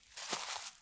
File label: Rustly Step, Footsteps, outdoor